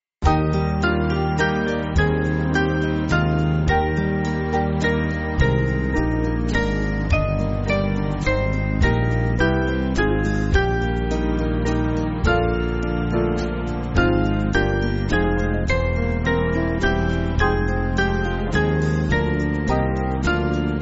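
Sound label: Band, Backing Files for Churches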